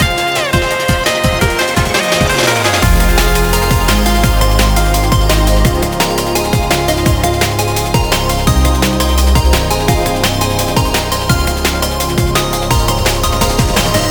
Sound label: drop